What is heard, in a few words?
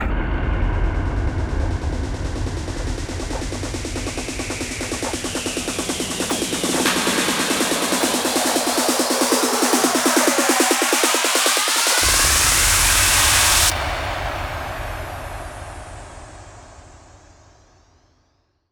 140BPM 8Bars, HandsUp & Trance Fills; Vengeance Samples